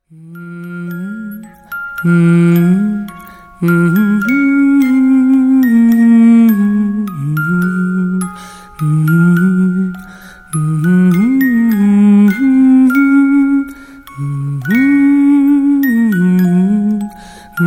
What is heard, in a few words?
lullaby; newborn